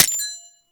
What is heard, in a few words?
Mech; Tenno; AK Bolto Prime